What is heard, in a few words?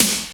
JD800 Dance Card Drums